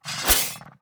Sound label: lycanthrope strike